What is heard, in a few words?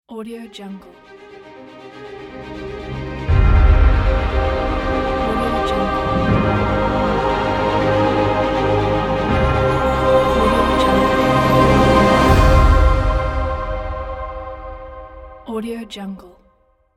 scifi logo